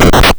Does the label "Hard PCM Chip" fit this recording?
Yes